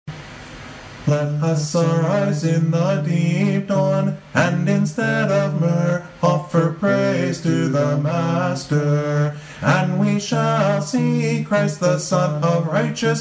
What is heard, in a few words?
paschal canon ode